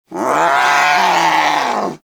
Vocal Alerted; Warframe; Hunting Critters; Vulpaphyla

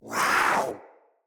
Kavat Vocal Attack Short; Warframes; Khora

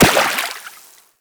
Operator Run Water, Footsteps; Warframe

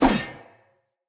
Sphere Polearm Whoosh

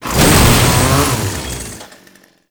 Ghoul Saw Melee Swing; weapons; Warframe; Grn Ghoul Saw Player; Kuva Ghoul Saw

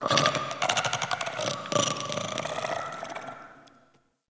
Create Vocals; Liset Alchemy Lab

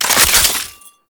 Belt Fed Rifle Reload; Warframe; Tenno; Frumentarius

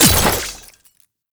Ammo Cast Start; Warframe; Frumentarius

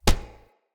Unarmed Punch Impact Metal; Weapon Impacts; Player; Environment

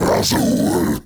Cast Vocal; Warframe; Uriel; Infernalis

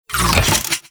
Un Equip, Shotgun; Crp BE Arca Plasmor

